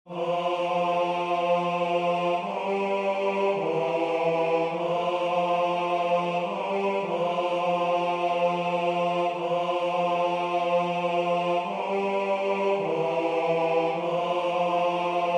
Ave Maria Bas

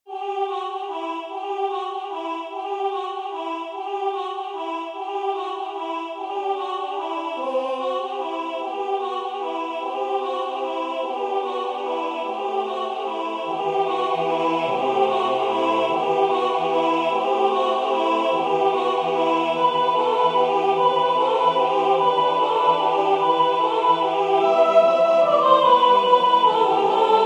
ukrainian bell song S; clover

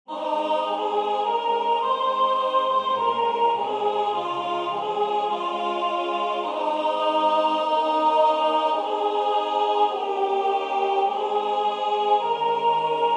lead kindly light S; newman

